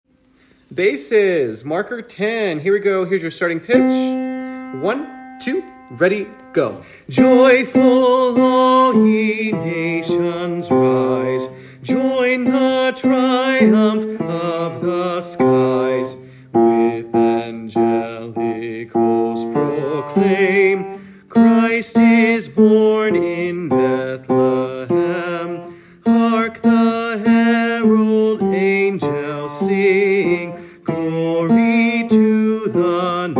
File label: Bass, choir